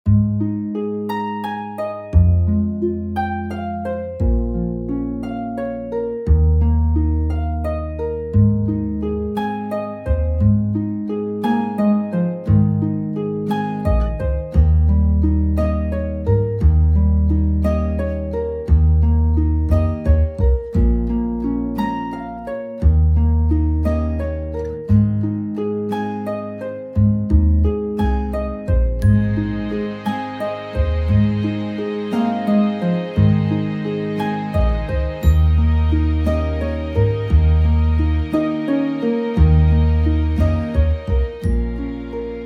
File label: (Ab To A) No Backing Vocals